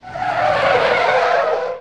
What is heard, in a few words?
Auto Tires Slide Road; Automobiles